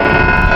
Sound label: Robots, Foley Wavetables; Cymatics; Foley Wavetables Vol 1; Serum